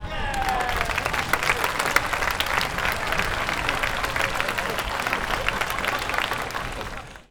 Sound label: crowds